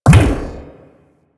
shock rifle projectile bounce; Warframe; Weapons; Corpus

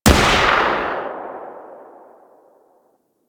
Female Sniper Shot 3D; Warframe; Eidolon; EidolonGrineerFemale

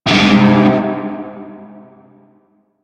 Shawzin Phrygian Chord K; Music; Warframe; Narmer Shawzin

